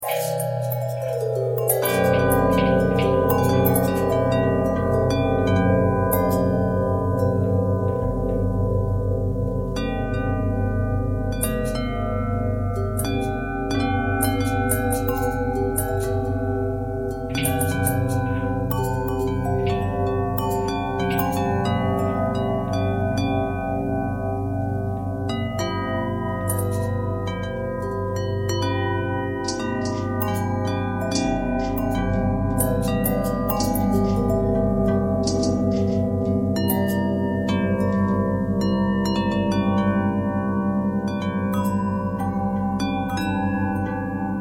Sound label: bell long bell twist, bells